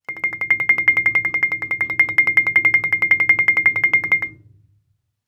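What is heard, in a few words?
Marimba roll fortissimo C7; MIS Pitches - 2014; Percussion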